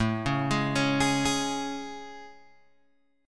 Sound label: A Major 7th A, Guitar, Open G